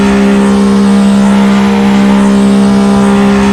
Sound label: IN ON MID, F1; AOS F1 2022; FSR2018